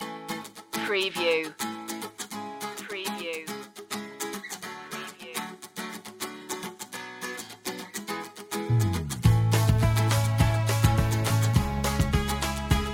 go for you no whistles or bells

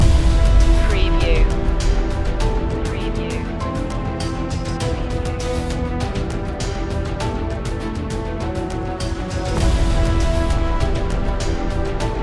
building drama loop

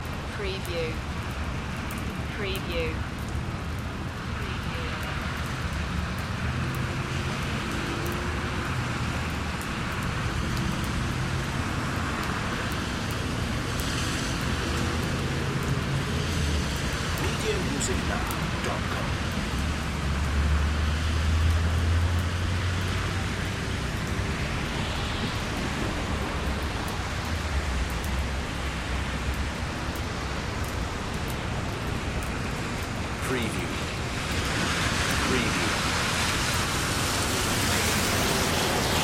Wet Traffic Close Up Rain Long

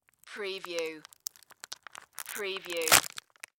Bag Plastic Open; sound effects bible

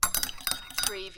Stirring in a cup with a tea spoon

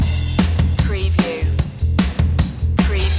bikers blues loop

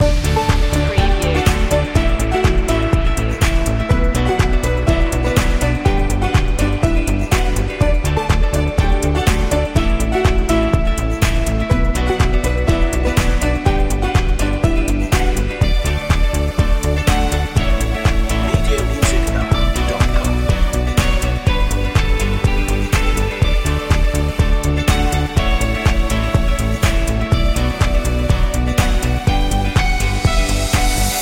background corp main loop

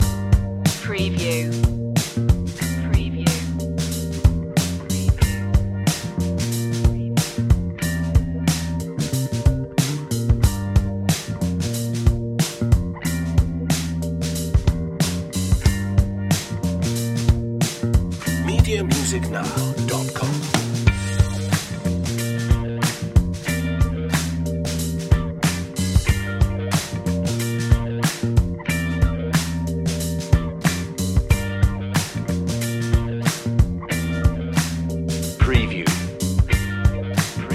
sweet groove

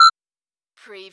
Servo Panel Sound Beep short

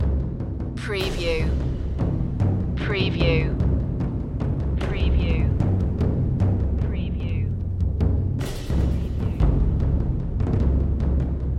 taiko triads loop

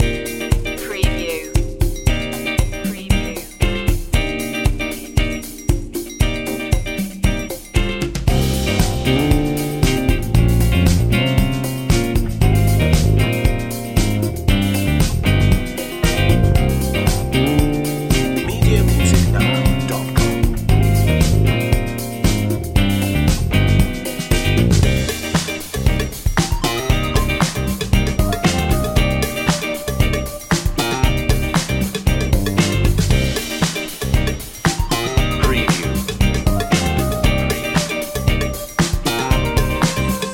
soul fusion

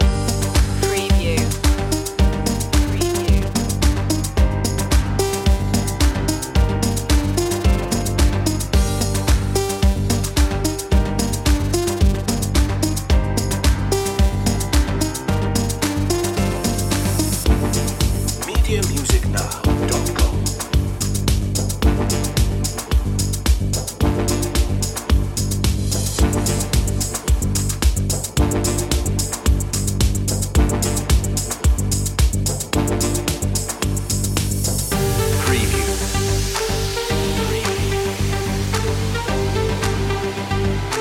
corporate machine loop long; media music now

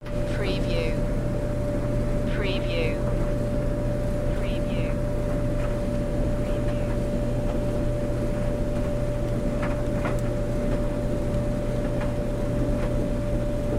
Dryer

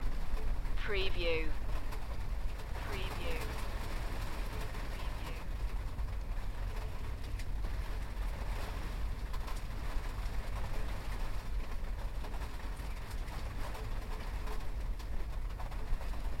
Relaxing rain loop

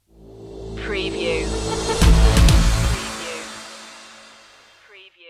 accelerate stinger

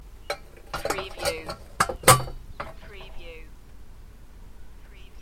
Lid On

